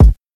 rich low end kick drum sample g sharp key

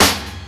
juicy snare one shot f sharp key

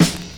dry acoustic snare sound e key

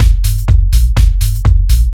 fat bouncy techno kick; drum loops

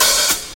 open hat sound c sharp key

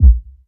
steel kick drum sample a sharp key

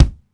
short kick drum sample a sharp key; kick drum samples

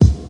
sharp kickdrum sample c sharp key; kick drum samples

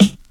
00s dry hip hop snare one shot g key; snare drum samples

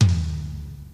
long room reverb tom drum single hit f sharp key

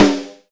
smooth bottom end snare drum sound c key

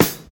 original snare one shot D key; snare drum samples

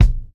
good kick drum single hit a sharp key; kick drum samples

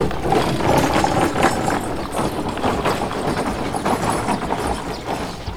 wagon and horses on asphalt

